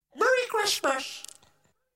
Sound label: Jingle